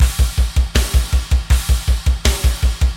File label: metal